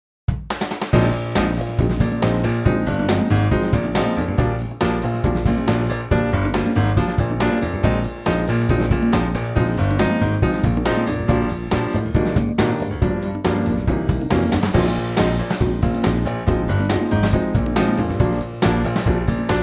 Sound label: Eb smooth